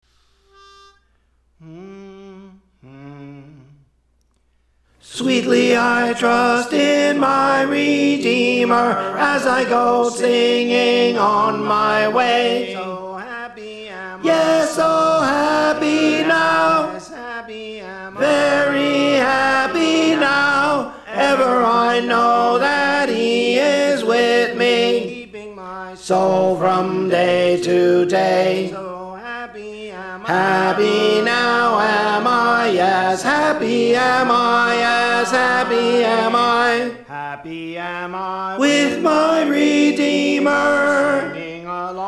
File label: Tenor; Praise For The Lord Training